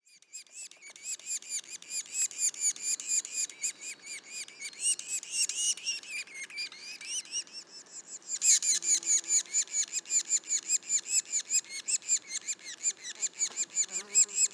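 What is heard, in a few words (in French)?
pic maculé juvéniles; mono; parc national du mont orford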